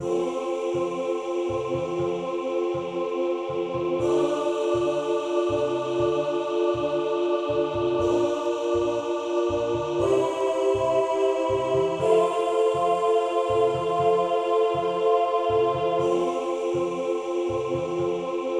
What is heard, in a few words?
SAT chords ten, choir